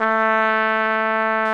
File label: Solo Brass, Tpt p, AKG